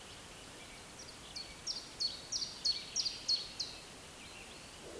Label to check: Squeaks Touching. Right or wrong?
Wrong